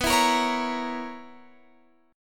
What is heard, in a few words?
B Major 7th Suspended 2nd Suspended 4th B down Guitar Open F; strummed